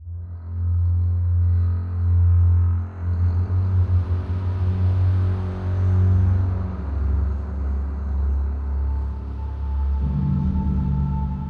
Amb; Amnesia: The Dark Descent

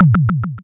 Teleport; browserquest; android

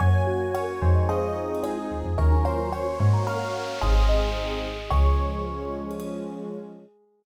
Jingle Game Complete

